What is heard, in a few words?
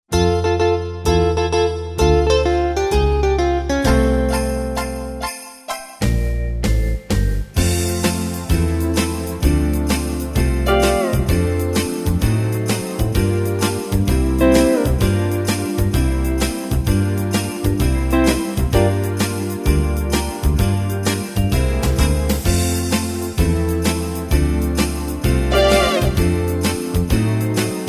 jingle rock